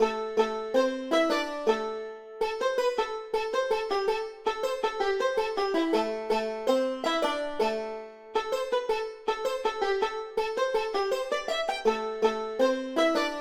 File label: Lead Music